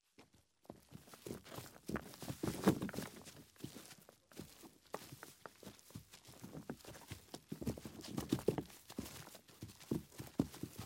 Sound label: Shuffling and Skittering; Mystara; TSR 2502 Hail The Heroes